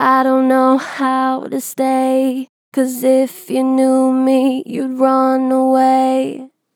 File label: Vib I dont know how to stay, Vocals, Natural Vibrato Strong; Harmor Vocals